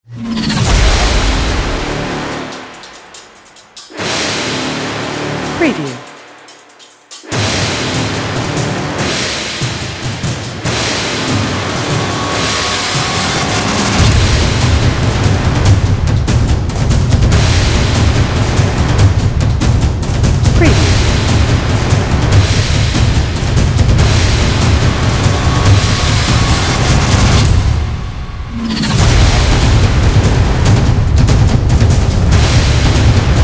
Epic Hybrid Sound Design Braams Impacts Horns Action Trailer